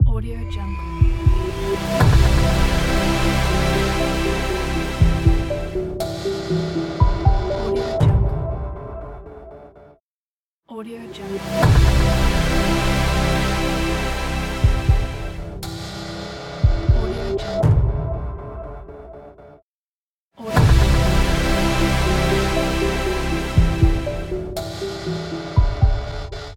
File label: majestic cinematic logo